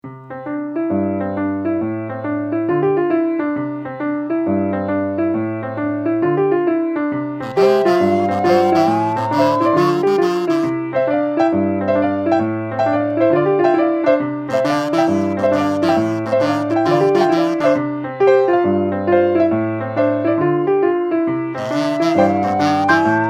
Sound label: jingle music